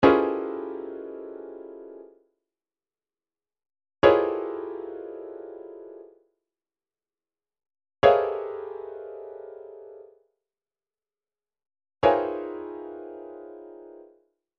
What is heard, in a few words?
chord lines C#, midi; Midi Files 1000